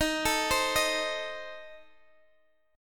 A Diminished, guitar

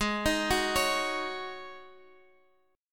Ab 7th Suspended 4th

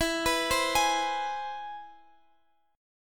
C Augmented Major 7th E

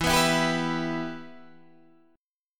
B Suspended 4th Sharp 5th, E, chord; strummed

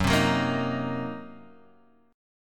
Gb 6th Flat 5th Gb, guitar

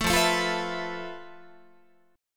F Major Sharp 11th, Guitar; strummed

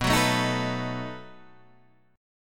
B 7th B down Guitar Standard; B7 strummed; JGuitar

